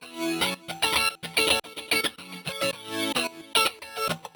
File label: 110 F# FX, MELO + FILTER; GrooveToMankind; RoBKTA Sample Pack Supreme